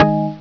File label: got item